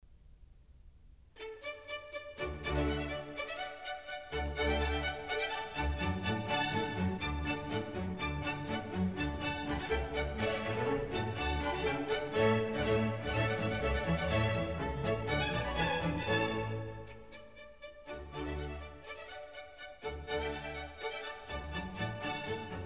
Music for the Royal Fireworks

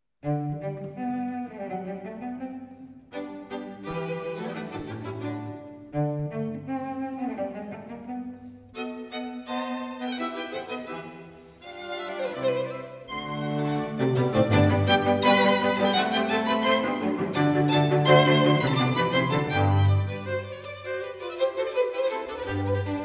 String Quartet in G Major Allegro molto; String Quartet No. 2; Op. 18 No. 2; quasi presto; Ludwig van Beethoven; Beethoven - String Quartets Nos. 1-16

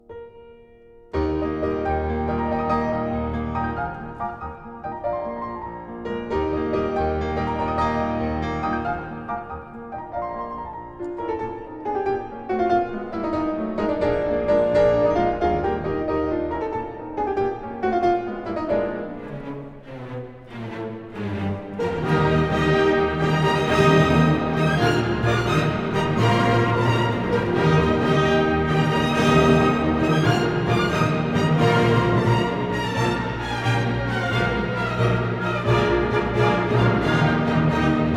Piano Concerto No. 5 in E-Flat Major; Op. 73; Beethoven

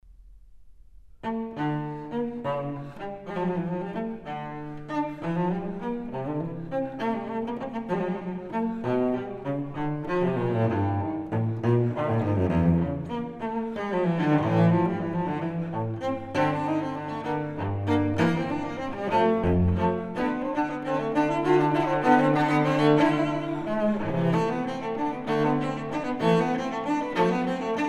Suite for solo cello No. 6 in D m; Bach; Cello Suites

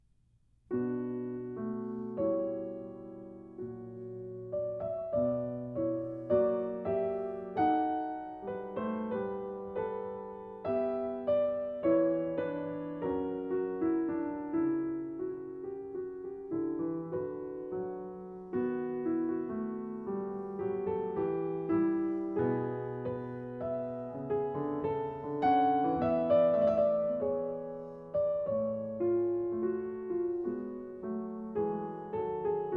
French Overture In B Minor

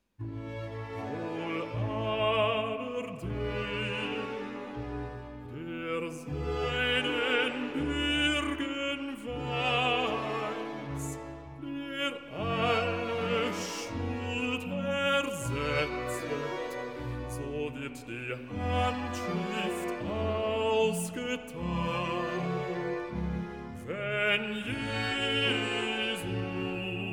Recitativo Basso; Cantata No. 105, 'Herr, gehe nicht ins Gericht' BWV105; Wohl aber dem, der s; Bach; Philippe Herreweghe; Cantatas

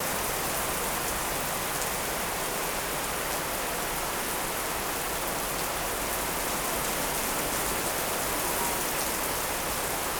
Portland Rain, Field Recordings; Oregon Rain; Omni Gardens

